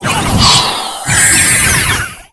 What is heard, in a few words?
Victory; Skylanders Cloud Patrol; Arcade, iOS, Mobile; Ninjini SFX